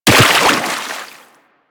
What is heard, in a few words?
Operator Land Soft Water, Footsteps; Warframe